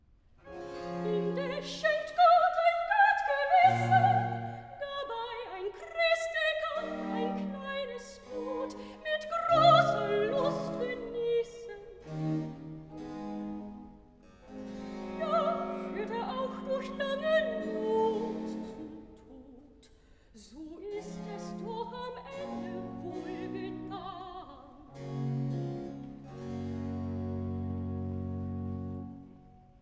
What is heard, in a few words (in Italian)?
Recitativo (Soprano); vocal; BWV075; Indes schenkt Gott ein gut Gewissen; BACH